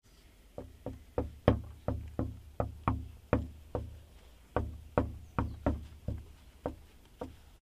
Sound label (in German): klopfen